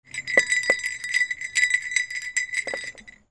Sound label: BELLS